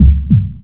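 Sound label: heartbeat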